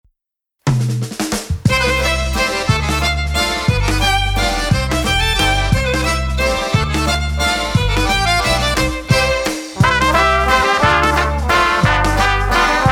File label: Old Time Polish Oberek